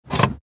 Auto Zentralverriegelung

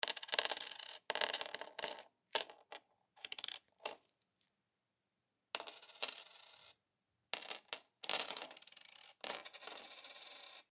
Muenzen fallen

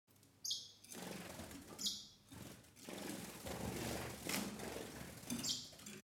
Voegel

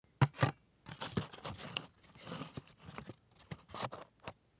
Kueche Ei Packung

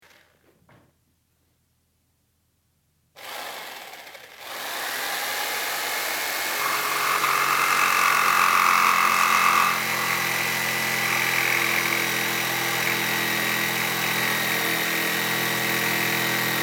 Stichsaege

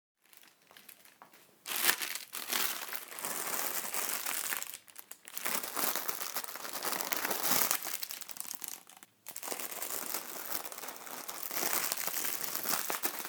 geknister

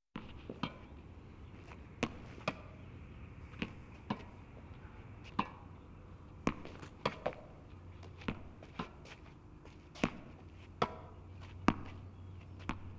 Sport Tennistraining

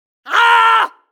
schreien weiblich

